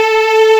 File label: String